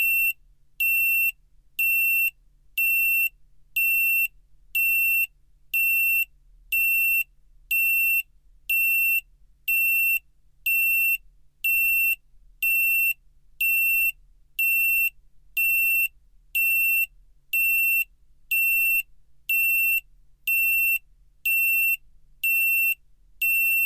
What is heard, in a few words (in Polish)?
Ton